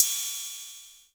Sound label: Cym; Roland SPD-S